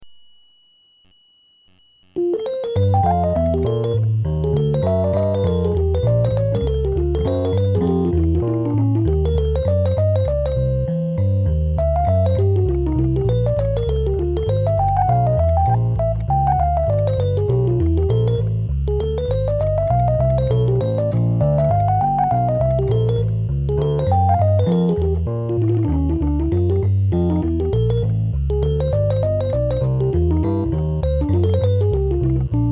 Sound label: etude C, jazz piano